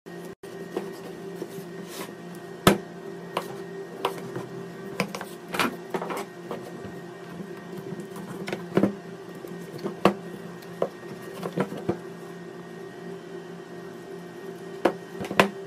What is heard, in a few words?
Cut meat, شقه کردن گوشت و استخوان